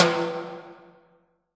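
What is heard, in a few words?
TIMBALE, drum machines; JV-1080 DRMS; EdgeSounds; Drum Mashines VOL-1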